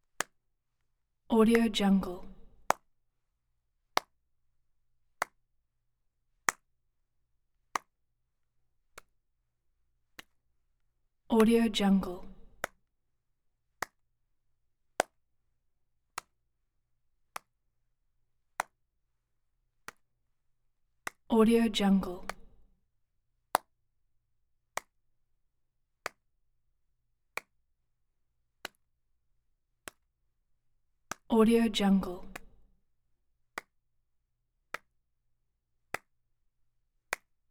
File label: hand clap unison medium intensity smacky; prosoundfx